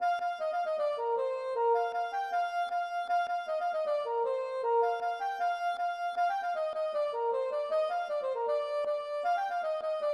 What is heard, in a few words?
gavotte; Suite Bagad Ergue Armel